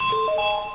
ring in

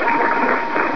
right ans

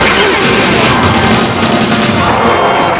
guard; usa

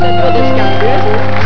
vowels; germany

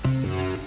times up

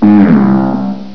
wrong

